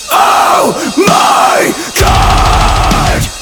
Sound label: omg screamo